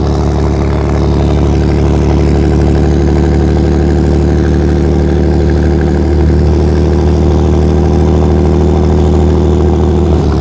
tank driving

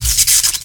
lightning impact

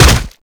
hit common blunt

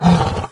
melee, doom3 pinky

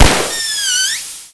flat tire

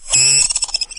tick pain; doom3

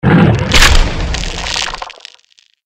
mirelurk attack power; magmalurk; npc

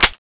clip in; tfa cso; dart pistol

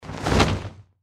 deathclaw swing

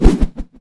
axe throw

